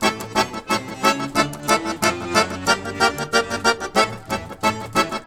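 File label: Balkanish Traditions accordion; USB Soundscan vol.63; AKAI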